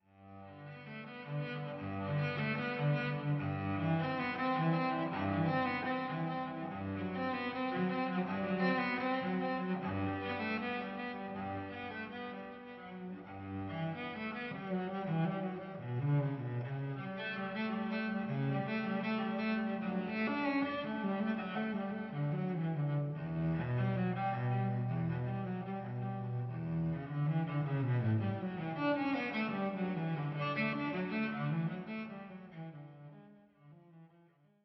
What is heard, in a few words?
solo cello bach